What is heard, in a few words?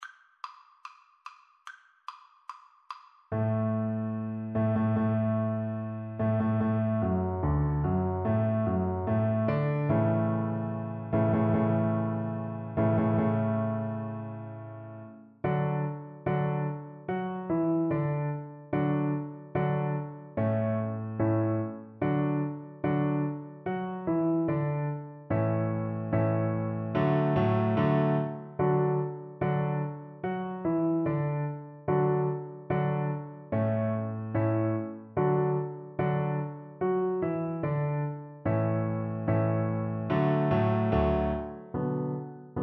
Cello